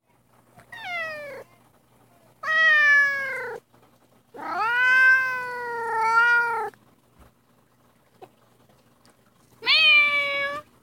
meow

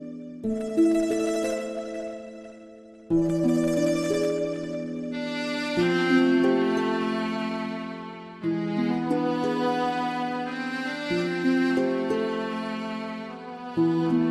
Soft Romantic

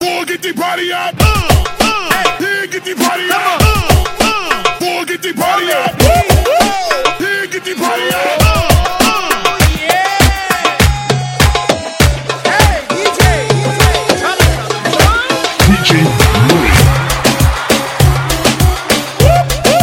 Rumba Party Mix

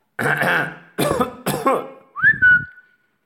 sms

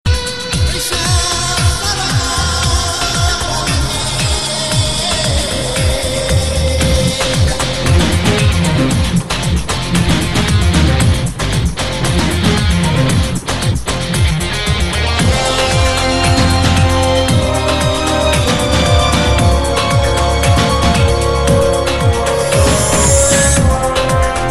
Race Arabic Tone

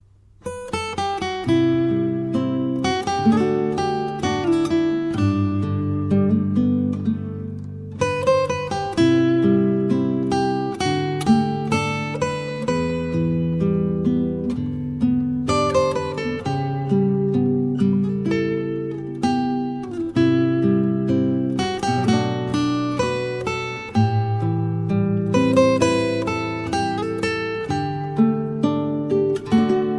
Nostalgia Guitar

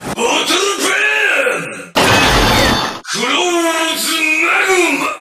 Bottle Burn

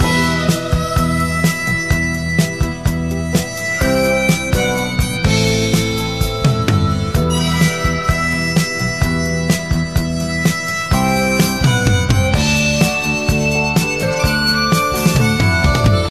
Pop Harmonica

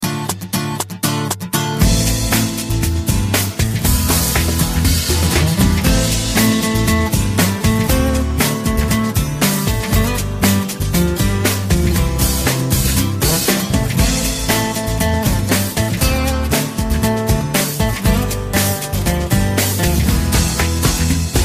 Romantic Guitar; Srk

